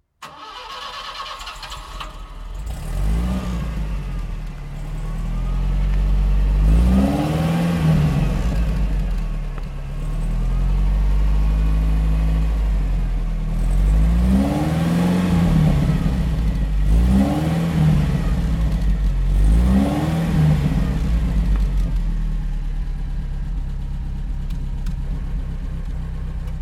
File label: Citroen DS 21 Cabrio; DS 21 Pallas; oldtimer